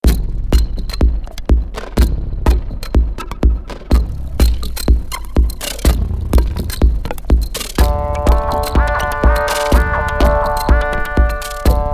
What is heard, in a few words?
cs50 Indian Harp; Curt Nolte